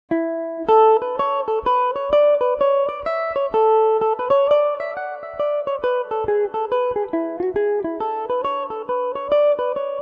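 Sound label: A major, irish mandolin; jim boultons fancy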